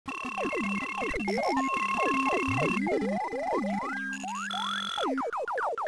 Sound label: arcade